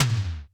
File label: Tom Slam